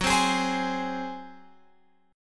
Gb Suspended 2nd Flat 5th Gb, down, Guitar, Open F; strummed